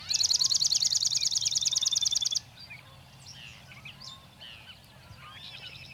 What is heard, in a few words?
swamp sparrow; saltmarsh